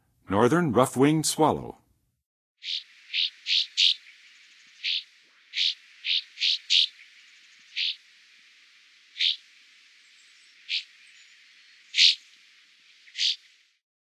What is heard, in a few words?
Northern Rough-Winged Swallow, Songs of Birds; North America; Western USA; Western USA Songbirds